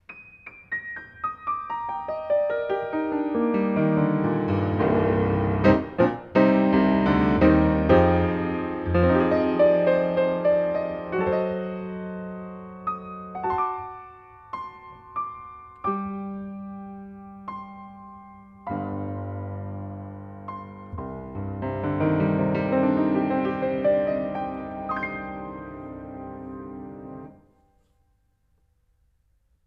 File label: under piano